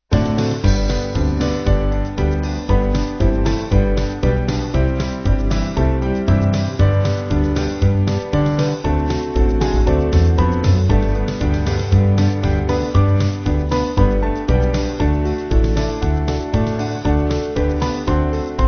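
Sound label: Band, Music Backing Files for Churches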